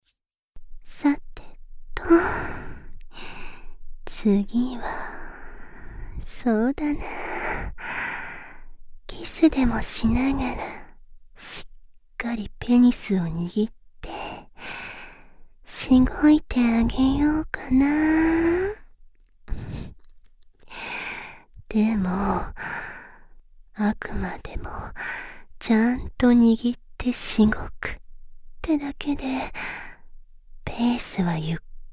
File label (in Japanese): キス と 手コキ (右耳), ASMR